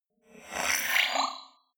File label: flyby splutter